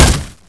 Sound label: wood, Bullet Impact